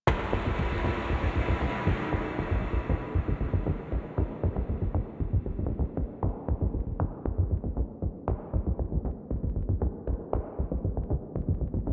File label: Hvy Blades Bass; Warframe; Dynamic Music; Grineer; Grineer One